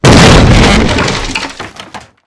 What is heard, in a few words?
hit gate; Mafie 1